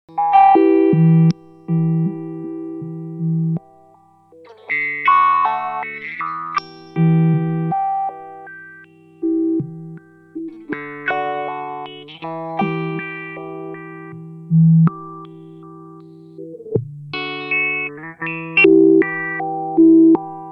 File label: Spatial Delivery V3 Sample and Hold Slow; Earthquaker Devices